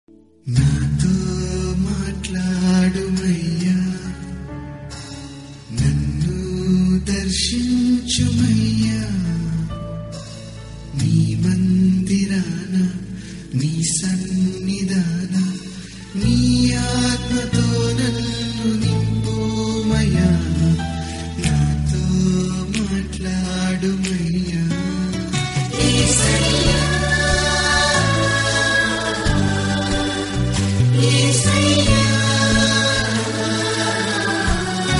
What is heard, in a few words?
jesus telugu songs